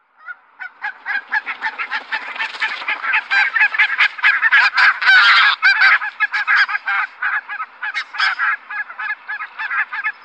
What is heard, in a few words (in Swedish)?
Vitkindad gas, Faglar